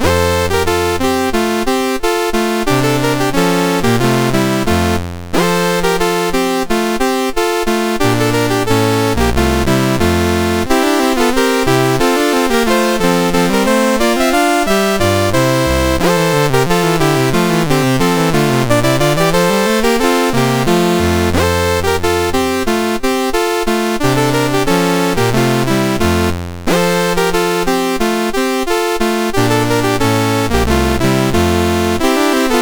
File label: Deck the Halls with Boughs of Holly MOS6581R2